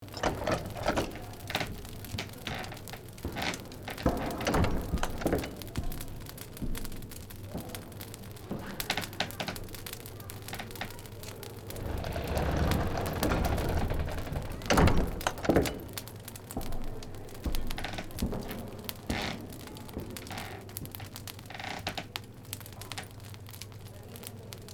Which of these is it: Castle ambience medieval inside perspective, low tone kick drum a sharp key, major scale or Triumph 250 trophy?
Castle ambience medieval inside perspective